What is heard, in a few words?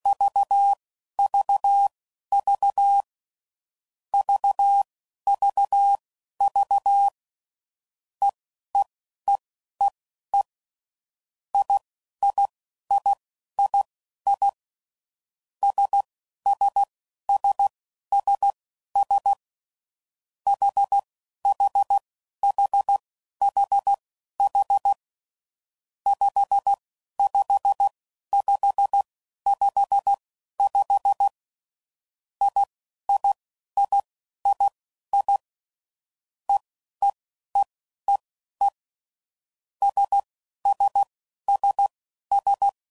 EISH5; Morse Code; CW Code Groups 10WPM; Basic Letter Groups; Basic Sets